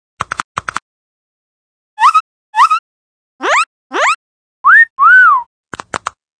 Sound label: emoticon